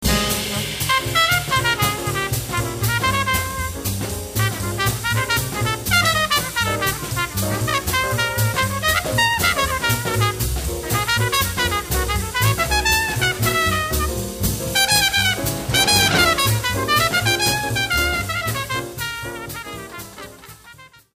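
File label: trumpet solo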